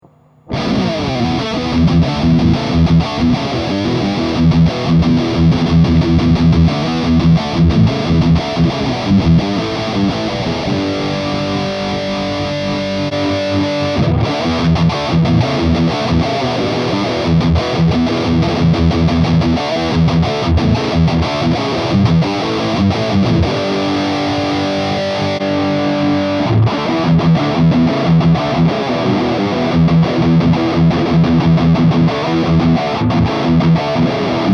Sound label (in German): Endstufen Switch; Cabloader